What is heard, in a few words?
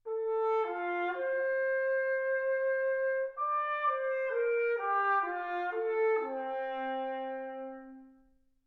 trumpet